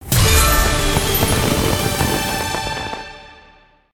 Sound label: mega win; Hot Fruits 100